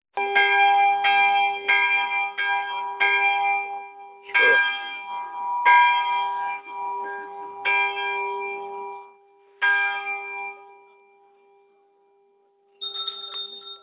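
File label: chapel bells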